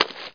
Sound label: step mud; SpecOps2